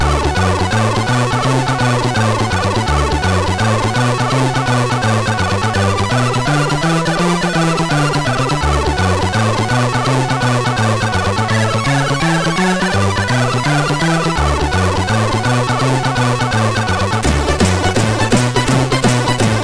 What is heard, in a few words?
Sound Example, MOS6581; MOS6581R3; hvsc; FAME; Hendriks Michael